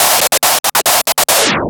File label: Scratch Fills 140BPM